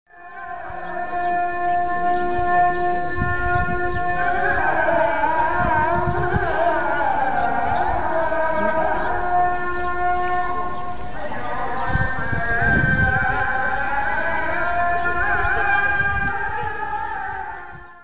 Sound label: mosque; turkey